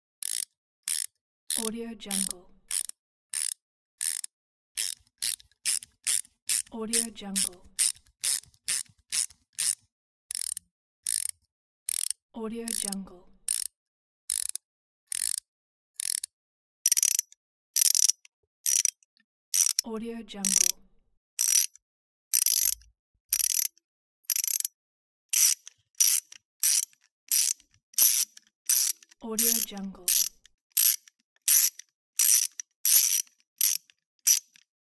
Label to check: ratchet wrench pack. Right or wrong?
Right